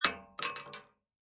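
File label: Bullet Shell Drop Shotgun 12 Gauge Metal; Bullet shell drop, Shotgun: empty Shotgun 12 Gauge shell falls on metal.; Impact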